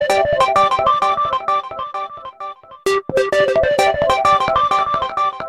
Latest Samsung Galaxy A25 Ringtone

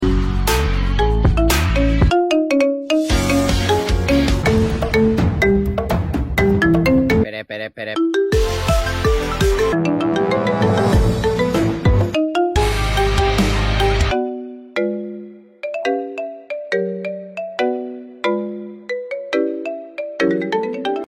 iPhone Ringtones

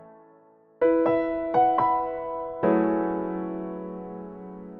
Cool Ringtone; Xiaomi Redmi Note 13 Pro Plus

